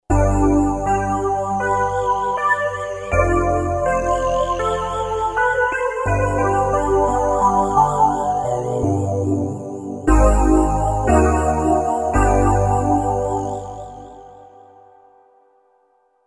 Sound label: Roland V-Synth; Raspberry; Factory Patches